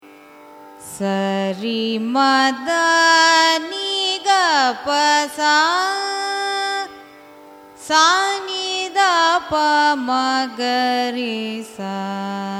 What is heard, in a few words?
raga kadanakuthoohalam arohanam avarohanam; carnatic music